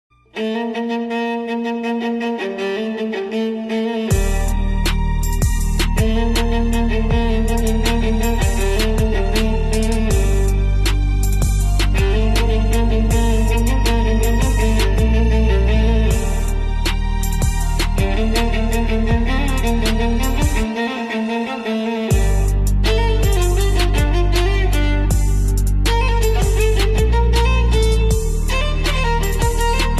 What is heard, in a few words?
Ringtone violin